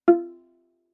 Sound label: Viola pizz ff sul G F4; MIS Pitches - 2014; Strings